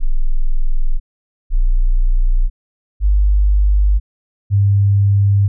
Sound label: tones